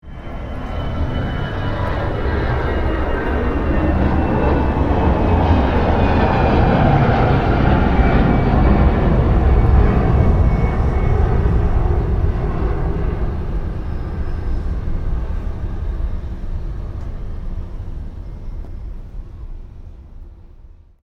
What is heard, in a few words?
airplane